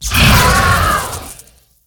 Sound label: Charged Devilsaur Attack